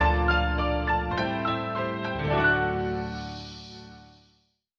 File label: piano lead in to it, Phonky loops